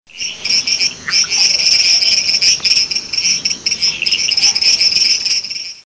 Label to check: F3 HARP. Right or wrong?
Wrong